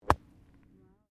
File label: Golf swing wedge bunker